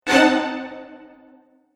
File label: Spook Cinematic Impact; Miscellaneous Impact Sound Effects